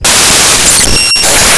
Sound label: portal exit; Scratch